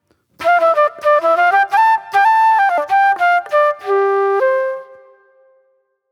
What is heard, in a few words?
Acid Jazz Flute